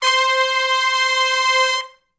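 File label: Hi Medium Long Note (C) unison, Horncraft; Sections; R&B; Crimson Blue and Fabulous Horncraft 4 RnB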